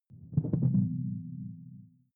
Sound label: sci-fi alien bloom; swooshes-swishes